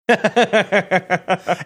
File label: chuckling